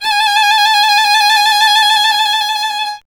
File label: STR VLN, Solo Instruments; Miroslav Vitous